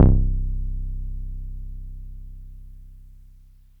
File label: 303 PLUCK; mikey303 ravepack